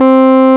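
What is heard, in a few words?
RMI piano C 3, EP; Sonic Reality - Vintage Timetraveler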